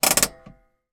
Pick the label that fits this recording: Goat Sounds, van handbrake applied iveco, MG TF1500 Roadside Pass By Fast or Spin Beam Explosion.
van handbrake applied iveco